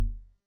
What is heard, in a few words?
error